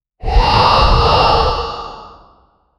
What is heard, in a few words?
dragon using its electric